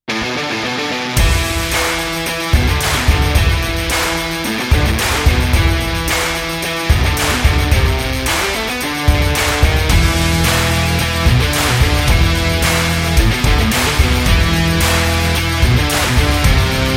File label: Energetic Rock Trailer